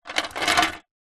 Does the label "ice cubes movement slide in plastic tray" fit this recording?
Yes